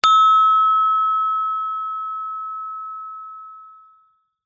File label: musical instrument gender small gamelan single mallet hit high